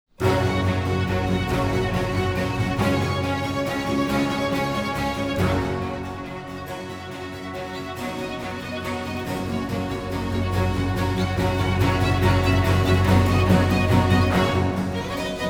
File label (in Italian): Sinfonia, Concerto in Sol maggiore per archi e cembalo, Allegro; Vivaldi; Tito Manlio (2005)